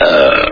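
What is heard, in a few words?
nausea burp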